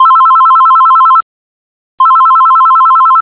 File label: ring